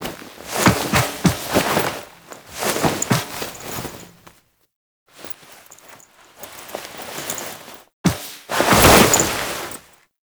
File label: Warp Gate Start Foley; Cinematics